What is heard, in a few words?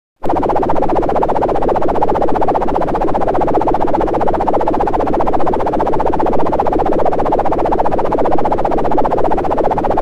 Helicopter